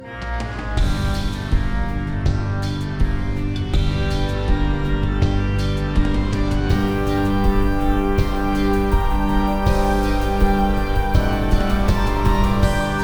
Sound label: battle; battle citadel; Spiral Knights